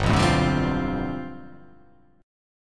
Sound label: G 11th